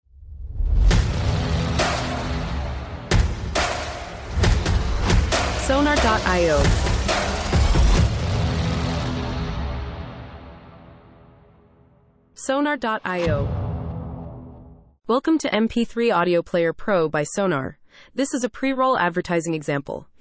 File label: Epic Stomp